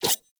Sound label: Dagger Whoosh Heavy; Sinecure Dagger